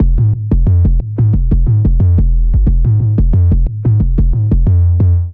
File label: 90bpm, Drums; MIDI Clips; Boom Butter; Ableton; Chop and Swing